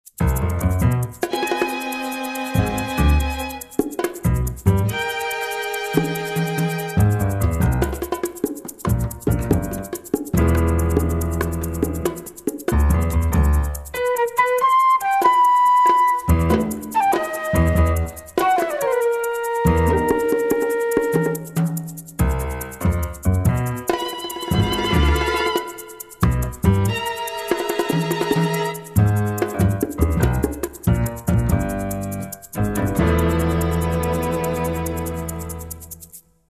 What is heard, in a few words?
crime tension